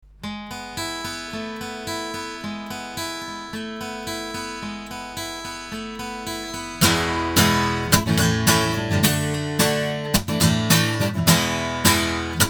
guitar, microphones; Lauten Oceanus

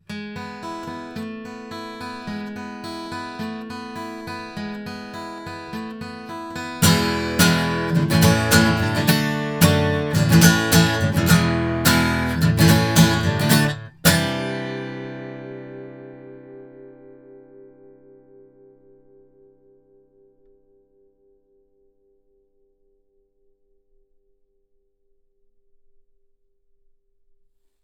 Blue Reactor cardioid guitar

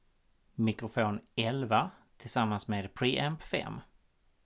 mic pre

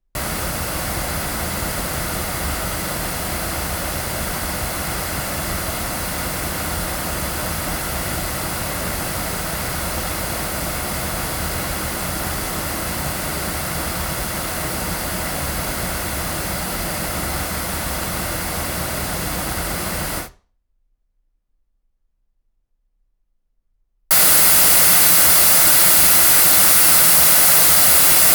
CV-12 tube cardioid loudspeakers; Avant

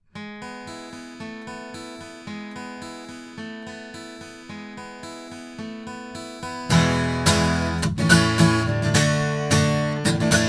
Avant CV-12 tube cardioid guitar

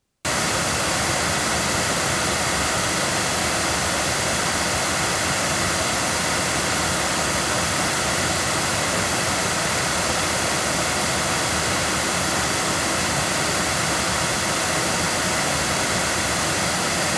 loudspeakers; Pearl TLC90; microphones

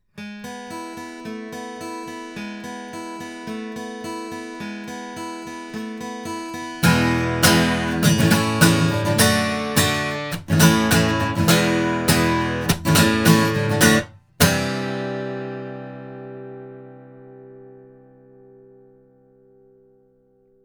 LineAudio SM6i omni guitar

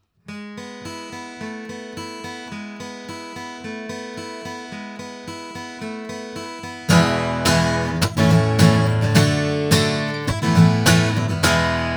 back guitar; CT NakedEye; crowley tripp

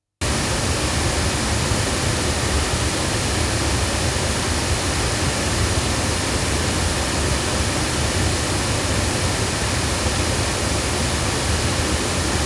loudspeakers; CT Studio Vocalist; microphones; Crowley Tripp

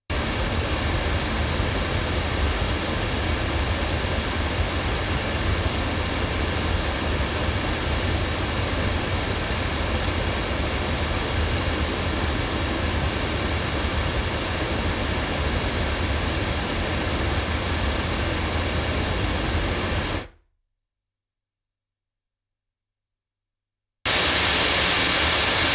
Violet Flamingo jr loudspeakers, microphones

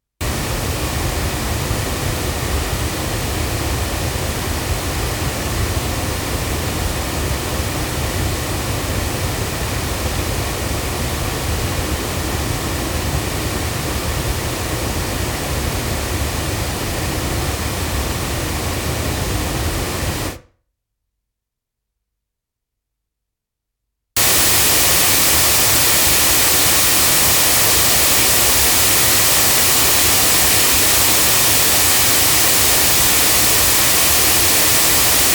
AEA R84 loudspeakers, microphones

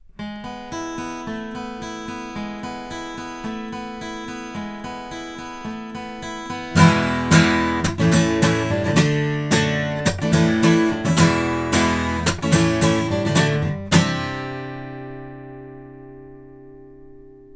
omni guitar, microphones; Lauten; Clarion

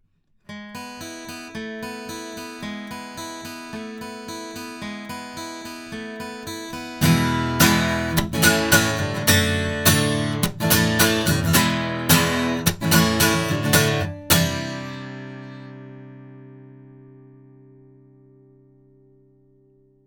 guitar

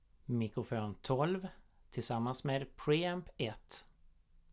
mic pre

